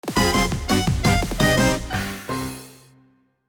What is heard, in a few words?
Dream Friend jingle; KSA; Daroach